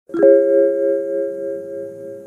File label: seatbelt